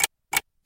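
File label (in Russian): звонкий короткий звук тикания часов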